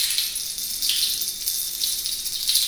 Metal Rain, Water Perc; Roland L-CD701

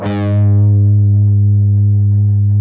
PRS A, Guitars, LEAD

